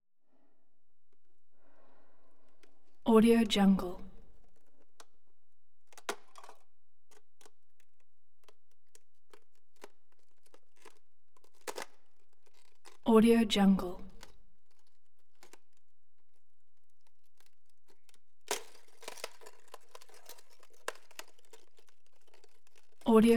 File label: ice breaking